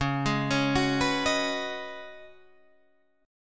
Db 9th Suspended 4th Db down Guitar Standard; arpeggiated; chord shape; JGuitar

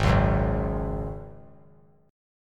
Ab 7th Suspended 4th Ab down; Ab7sus4 strummed

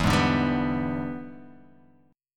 E 7th Sharp 9th E down Guitar Half Step Down

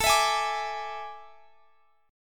A Major 7th Suspended 4th; AM7sus4; strummed; x,x,19,21,21,22

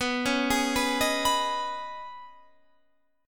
Eb 7th Suspended 4th Sharp 5th, B

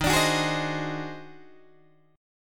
Eb Minor Major 13th, Guitar, DADGAD

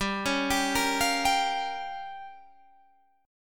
G Minor 7th Flat 5th, Guitar Standard; 15,16,17,15,18,15; Gm7b5 arpeggiated; capo=2

